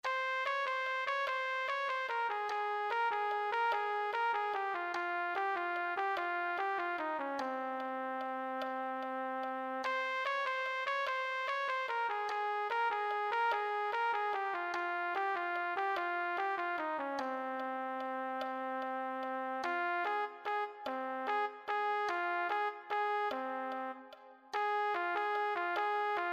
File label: main trumpet